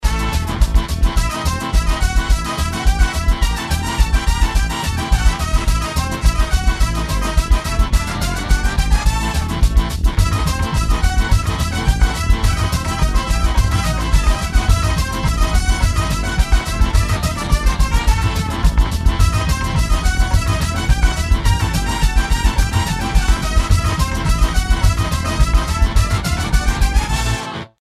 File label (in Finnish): humppa